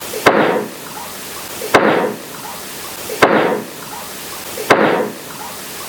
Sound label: knock